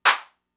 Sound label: Clap; Fricke MFB-522